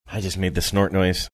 i just made snort noise

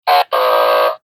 thumbs down wrong

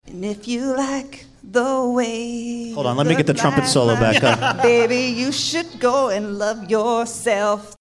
singing portion of

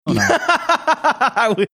laughing

